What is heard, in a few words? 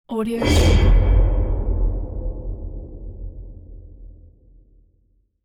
metal woosh